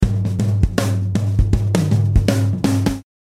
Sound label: Drums